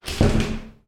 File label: household shower door close distant reverberant